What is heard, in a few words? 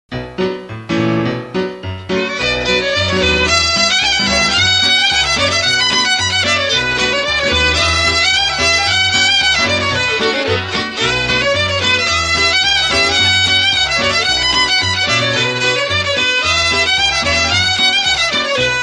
reel instrumental